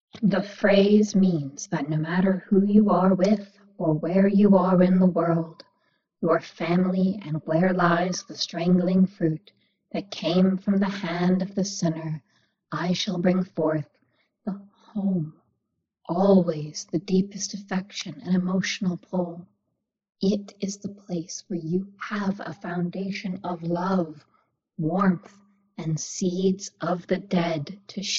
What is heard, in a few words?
Drone; House Of Leaves